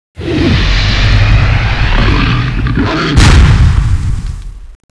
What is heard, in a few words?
attack; Revenant